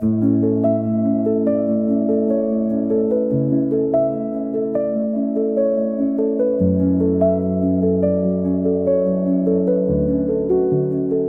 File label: 639 HZ